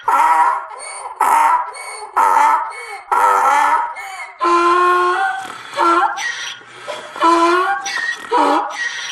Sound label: Laugh It Up, Emote; Fortnite